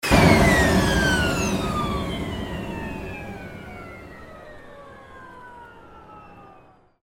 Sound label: dry stop